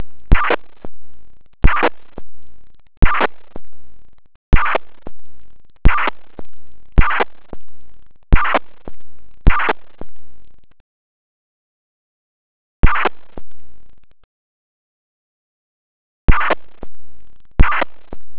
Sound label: signal